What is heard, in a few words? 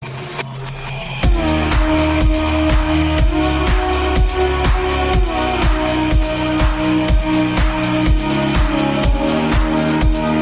Dreamscape